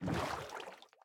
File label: paddle water, boat; minecraft